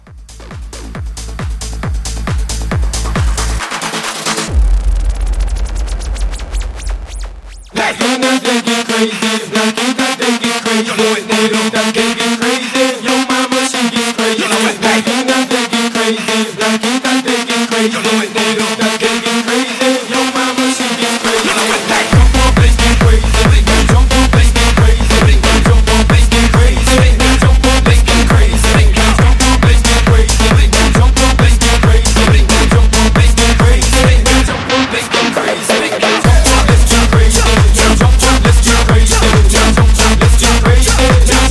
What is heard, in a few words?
EDM House Mashup 136